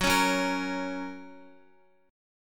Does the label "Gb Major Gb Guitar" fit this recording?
Yes